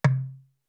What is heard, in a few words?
R8 PERC; Greytsounds VOL-1